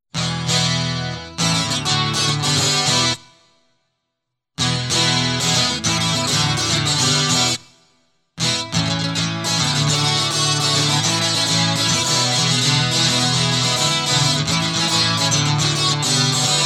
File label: Guitar Loop